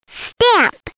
STAMP

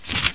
go sound; ProOne: Netcracker Netscape Navigator

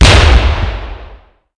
a ex frag air; The Sum of All Fears

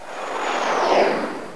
missle; MacAttack 1.92

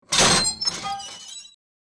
metal bar door; The Sum of All Fears

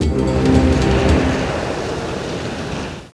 winds

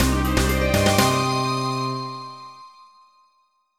win; Nichibutsu Mahjong Collection; gion

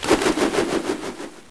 magic spin; player magic spin; Ferazel's Wand Demo

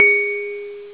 bonus; 8,000 sample rate

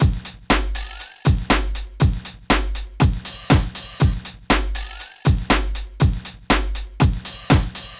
beat; Sonic Foundry; mac money rb 101

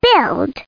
Build

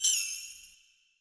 Swish

